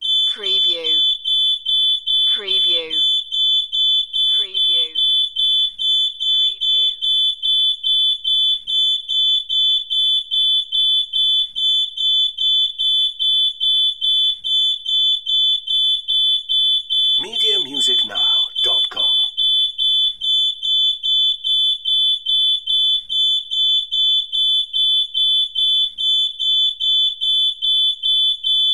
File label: Fire Alarm Long